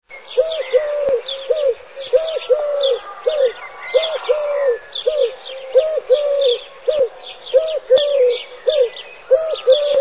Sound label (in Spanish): canto Tortola Turca